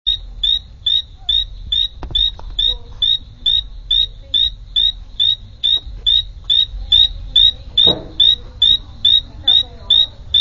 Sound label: Ameerega flavopicta